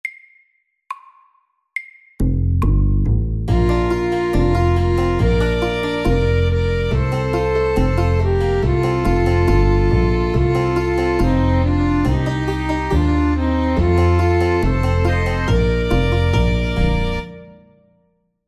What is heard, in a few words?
Country and western SAKTE